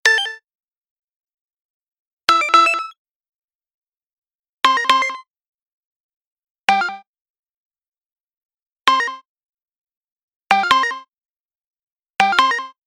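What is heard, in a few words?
high-tech interface clicks with noise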